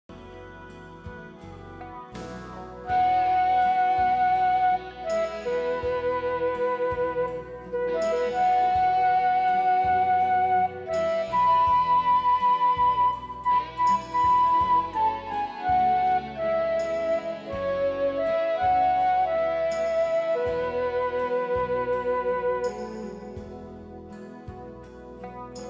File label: Flute; Siren Song